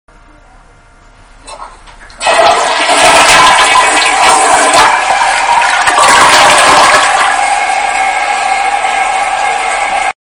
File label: Toilet Flushing Sound